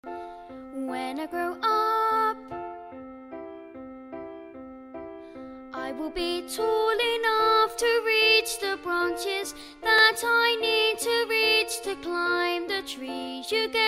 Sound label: vocals; amp regional arts and media program